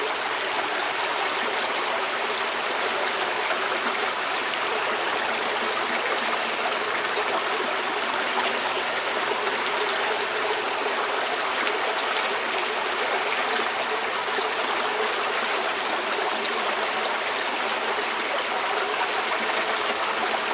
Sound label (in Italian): acqua e riverbero; Inquisitor 2